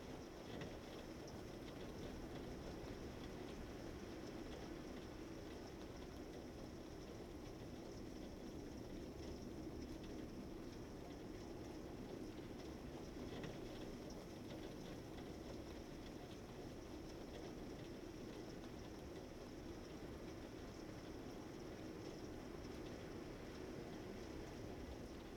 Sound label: ambient Inside Wind Rain; Zomboid 41.78.16; Project Zomboid